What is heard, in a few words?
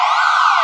siren